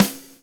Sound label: 116 groove; AMG Gota Yashiki Groove Activator